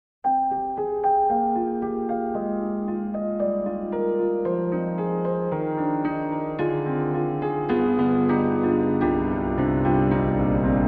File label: Rosewood Grand Dream Ambience; Orange Tree Samples; Evolution Rosewood Grand